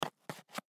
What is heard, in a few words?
Cigarette Box Close Cardboard; Cardboard (Sound Effects:Materials); Foley; Blastwave